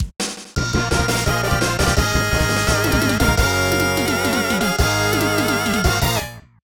Stage Clear; Mega Man X

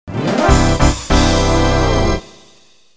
Starting Race

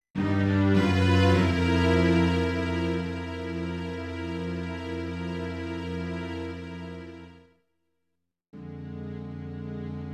Game Over

